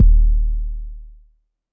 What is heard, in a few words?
999 Kick Long, drum kit; Concrete Collage Drum Kit; Concrete-999